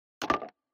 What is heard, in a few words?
wood material